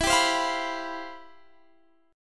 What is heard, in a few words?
C Major 11th E